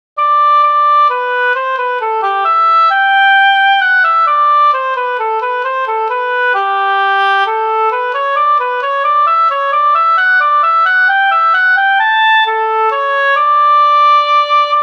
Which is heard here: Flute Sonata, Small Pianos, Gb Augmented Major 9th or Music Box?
Flute Sonata